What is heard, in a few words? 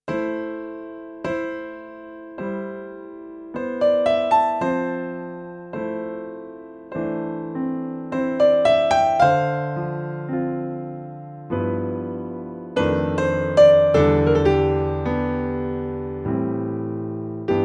stagepianos roland grandpiano